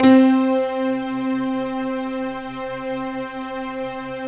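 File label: bright electric piano strings; Optical Media International - Sonic Images Library; Mellopia Str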